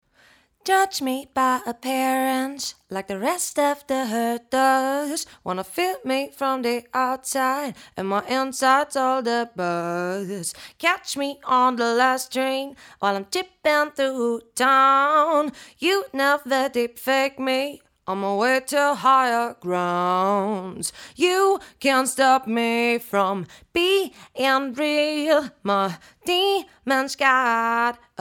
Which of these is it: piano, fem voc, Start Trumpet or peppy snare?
fem voc